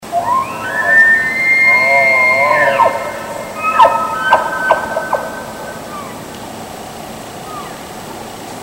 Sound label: aggressive bugle cow mew, elk